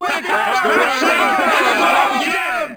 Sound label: burst, Crowd; English; Freestyle Street Soccer; XBOX